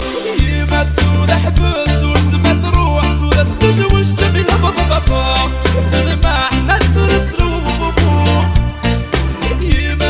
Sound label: coolest reggae